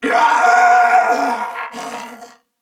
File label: Death, Male; Dialog; Barks; Battle; Corpus Venus; Warframe; CorpusVenusMaleA